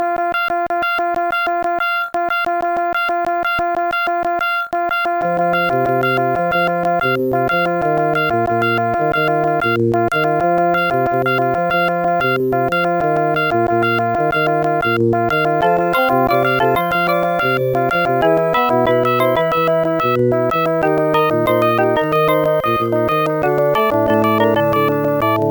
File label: bossa song; The Music Studio